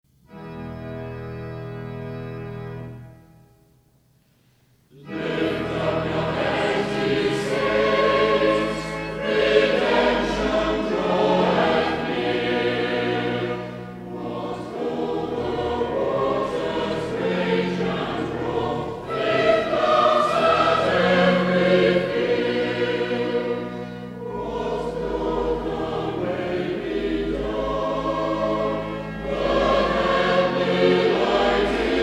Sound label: Choir, hymns